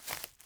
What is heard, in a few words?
foliage step